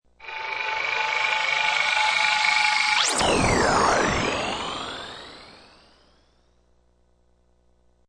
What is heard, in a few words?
Sound effect with long high pitch surge and space ship blasts of; Space Ship Take Off